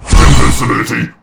invincibility; garrysmod

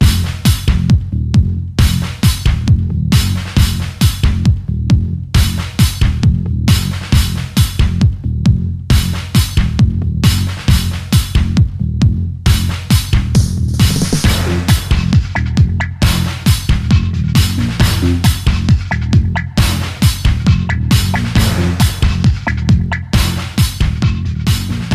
bank mission start; garrysmod

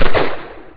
pistol fire; garrysmod; combine

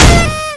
confetti nade; garrysmod

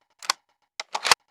reload, combine pistol; garrysmod

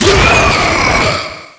hell death; garrysmod

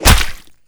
pickaxe hit flesh; garrysmod

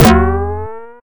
boing; garrysmod

